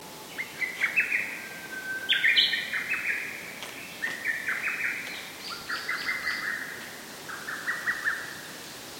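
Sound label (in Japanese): hototogisu, lesser cuckoo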